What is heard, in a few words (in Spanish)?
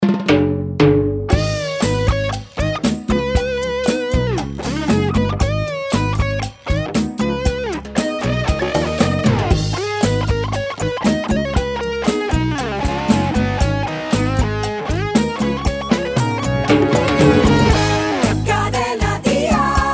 guitarra latina